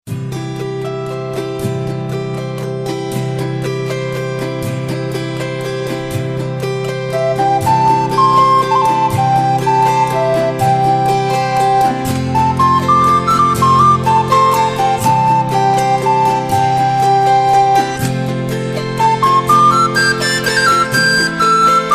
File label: Serenade; Shepherds Serenade; Early serenade